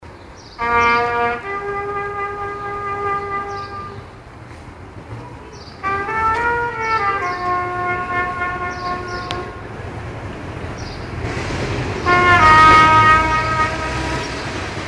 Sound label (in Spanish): Sonidos de la calle